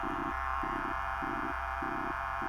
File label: Dog Tag Uncommon Loop; Syndicates